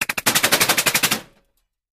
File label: Bullet Impacts Metal